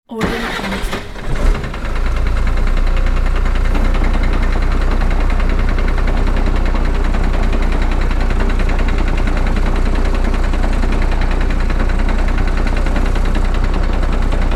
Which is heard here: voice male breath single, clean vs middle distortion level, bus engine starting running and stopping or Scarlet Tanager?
bus engine starting running and stopping